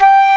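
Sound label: woodwind G3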